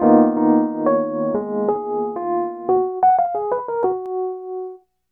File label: pan; 90bpm; Demo Multitracks